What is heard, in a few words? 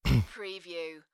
Male throat clear; beanstalk audio